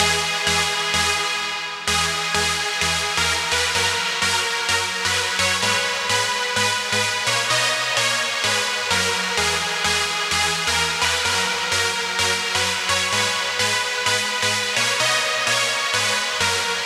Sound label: Pad Synth, 128 BPM; Vengeance Samples; Vocal-Hook Kits; Song Kit